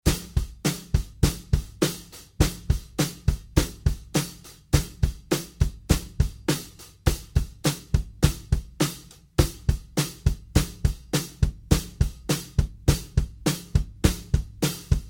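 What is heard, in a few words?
103 70s pop rock full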